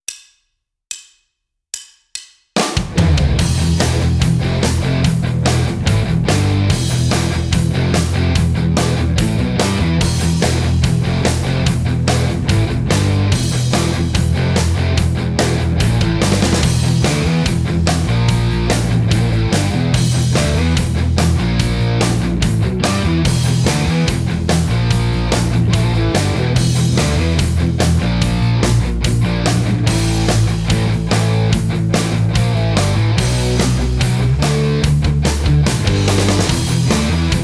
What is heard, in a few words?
Rhythm Guitar